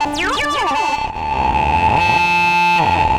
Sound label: Acid Bass